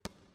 传球; 战中; nba

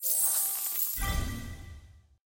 机票奖励; nba

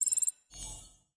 编队 工资帽薪资提升; nba

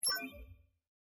战斗小横幅音效; nba; 战中

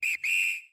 犯规音效（吹哨）; nba; 战中

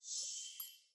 战斗开始时上下两排ui入场; nba; 战中

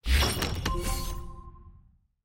球队入场; nba

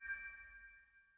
回收到球探报告; nba; 球探系统

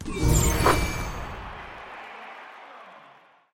主页入场; nba

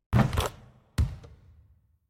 投中; 战中; nba

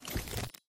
球星图鉴 卡片铺开; nba

